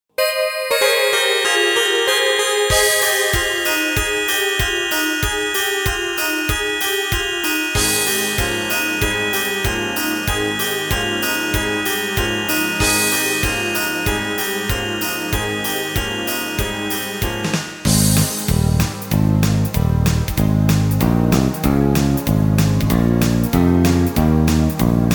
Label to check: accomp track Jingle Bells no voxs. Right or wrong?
Right